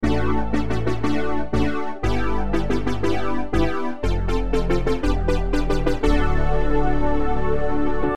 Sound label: Midi; ULTIMA01